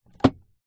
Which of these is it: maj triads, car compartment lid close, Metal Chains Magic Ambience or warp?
car compartment lid close